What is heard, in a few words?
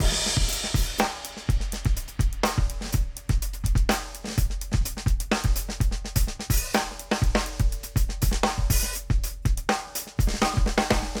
Busy 3/4 124 bpm, drums loops; BASEMENT DRUMS VOL.1